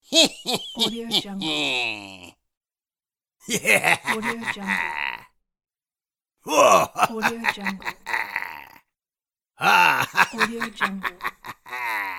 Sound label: cartoon thief laughter